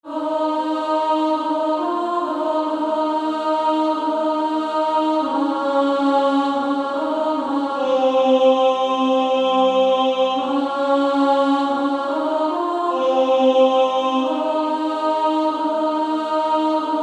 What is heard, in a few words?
Female Choir A1